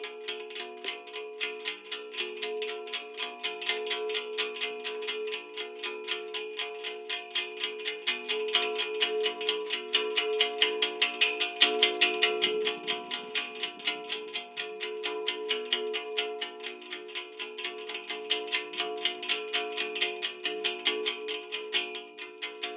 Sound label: tuned flagpole mix